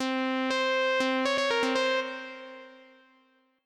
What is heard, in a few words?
moog